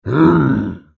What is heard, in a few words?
zombie; halloween